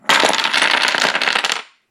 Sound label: jenga